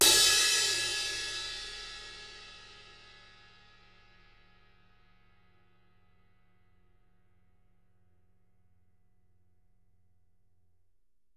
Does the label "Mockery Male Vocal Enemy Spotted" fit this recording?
No